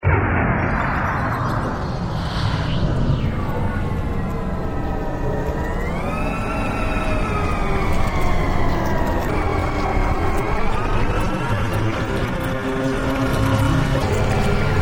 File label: overload saw